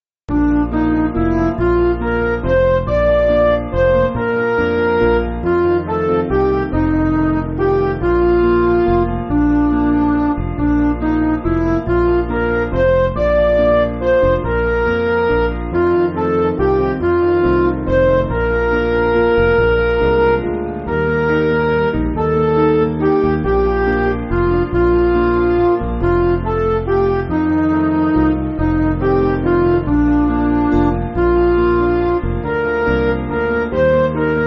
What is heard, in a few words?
Midi, Music Backing